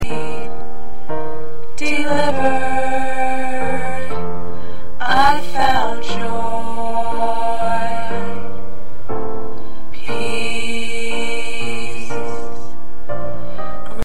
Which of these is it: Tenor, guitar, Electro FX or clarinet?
Tenor